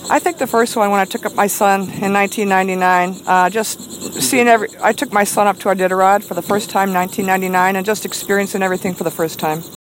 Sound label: Laugher